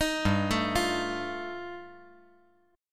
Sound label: G 7th Suspended 2nd Sharp 5th, G, chord; 8,7,5,8; down; 5G,4C,5E,5A